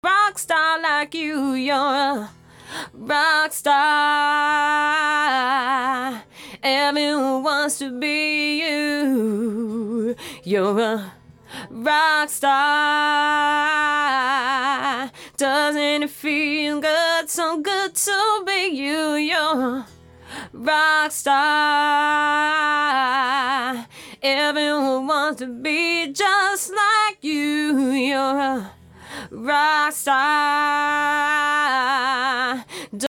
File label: Vox Stereo; Rockstar; ToolBox